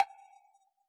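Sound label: wood block low; AnlgKit1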